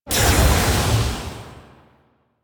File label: Sword Heavy Attack Burst; Enemies; Weapons; DE; Warframe; Teshin